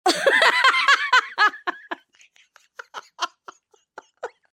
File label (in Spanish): risa nino